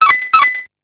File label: confirmation; Alcatel OT-756